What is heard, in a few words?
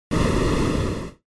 SNES RPG Magic Fire